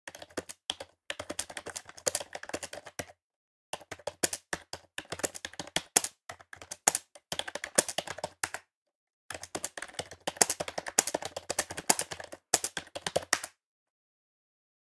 keyboard (desktop-ps2); devices accessories utilities; NS Studios - ultra sound collection

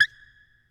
Basketball Squeak

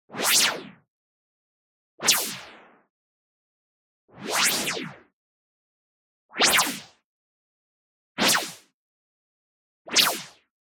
WHOOSH Noise Double Filter Short; boom library; Anime Essentials

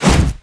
Creature Melee Physical; Kilta

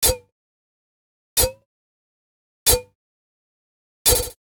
Generic Click Digital

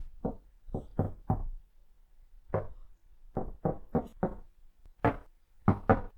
knock; Apotheorasis

